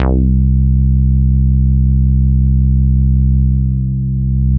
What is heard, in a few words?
Synths BASS